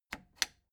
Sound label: plastic button latch loose click disable slow